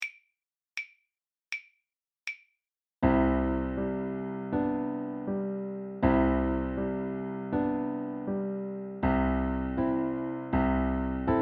Etude accomp, level2violin; The Violin Fun Book (Level 2); Etude #1

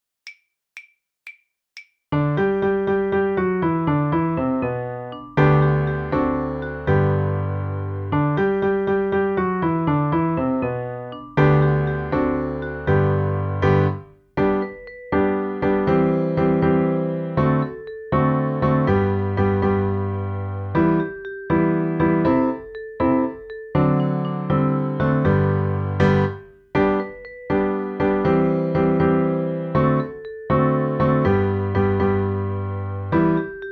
accomp; The Violin Fun Book (Level 2); Bingo

